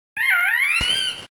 "Grito de Chimchar", Pokémon; Gritos de Pokémon de la cuarta generación

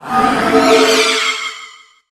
Grito de Dragapult

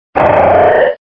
Grito de Swalot; Pokémon

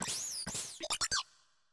Grito de Shroodle; Categoría:Gritos de Pokémon de la novena generación

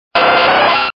Grito de Tentacool

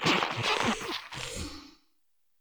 Grito de Spidops; Pokémon; Gritos de Pokémon de la novena generación

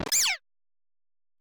Grito; Sprigatito

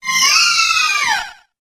Grito de Alcremie

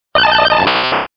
Grito de Dugtrio

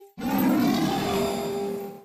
Grito de Solgaleo

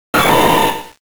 Grito; Breloom

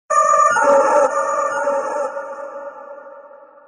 Grito de Butterfree Gigamax

